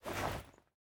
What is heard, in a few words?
footstep cape; smite; Apollo